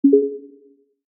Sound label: ping